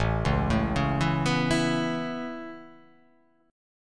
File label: A Major Add 9th A, chord